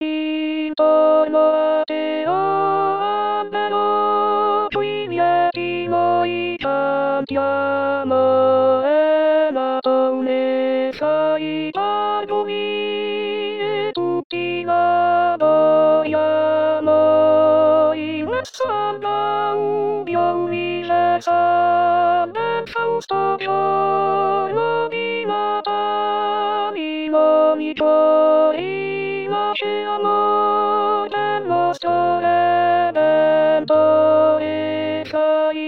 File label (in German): O Tannenbaum Contralti; natale